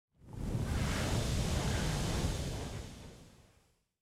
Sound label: lava; factorio